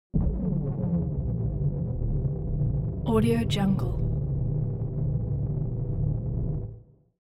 sci-fi space power regulating world blaster

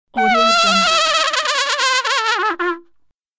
trumpet laughter

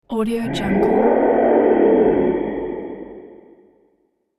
fantasy dragon of angst

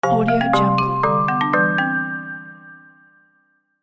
xylophone level up

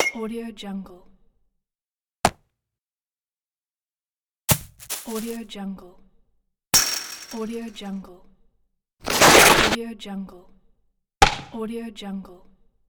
baseball sounds pack

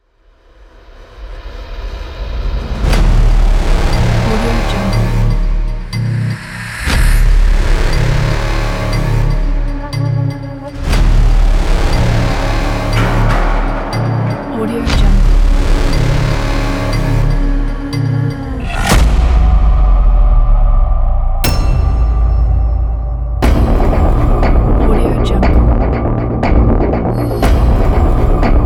hollywood dark horror trailer

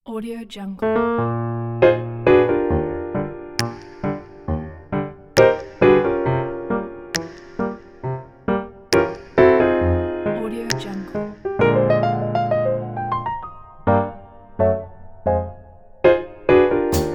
jazz piano acoustic

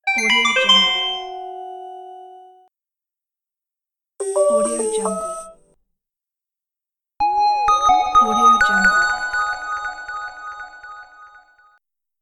turning on device

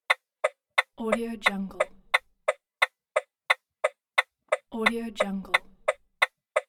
hazard lights loop

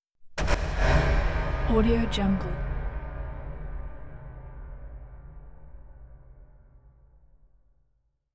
industrial fatal impact; hollywoodedge

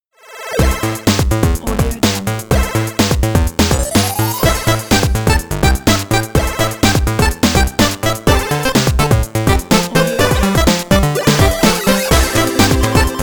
retro 8bit intro logo

